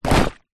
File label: leisure lilo popped full of water place down